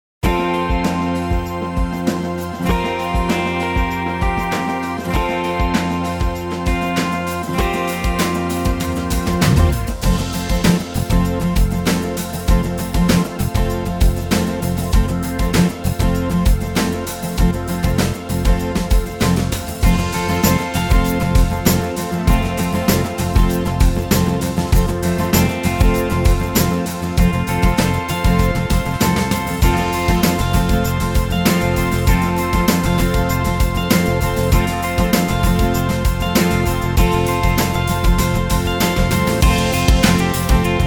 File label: 90s Guitar Pop; Genos2